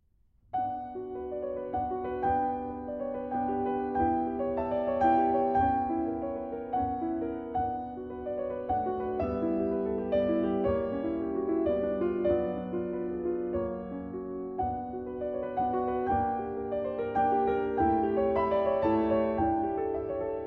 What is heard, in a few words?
Lieder For Piano, Allegro Moderato; Op. 8; No. 1; H-U 423